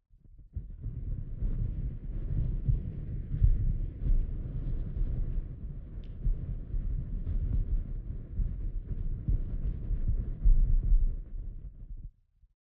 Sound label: TORCH NO WIND